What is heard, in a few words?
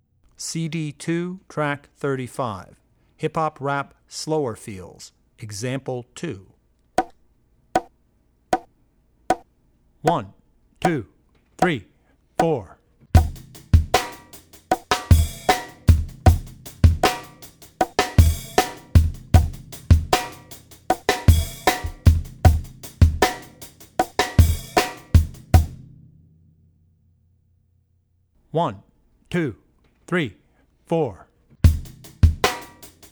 Hip Hop Rap - Slower Feel; CD2 Track35 Hip Hop Slow Feel; Drummer's Bible Tracks